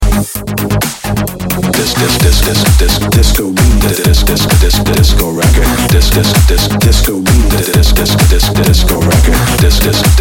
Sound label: Disco Rocker